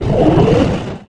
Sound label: Speed Break; PC Gamer IT CD 47; USAF; USAFDEMO